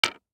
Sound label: DICE on Hard Wood, Drop, Standard, Two Dice; Samples; ArticulatedSounds; DICE Sfx Party (Extended)